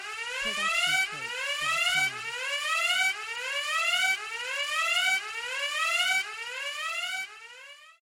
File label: siren; soundscrate